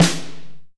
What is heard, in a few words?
astard, snares real